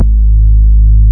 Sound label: BAS Mini; Best Service Gigapack 2